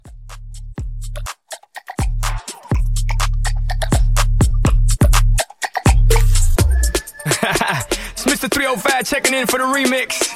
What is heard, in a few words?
Mashup 124